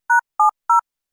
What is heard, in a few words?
Low frequency UP; 低周波数の音量を上げ下げできます。; 補聴器; 音量感や声の重厚感が強調されますが、上げすぎるとトンネルの中で話しているような響いた声になります; AMP補聴器調整用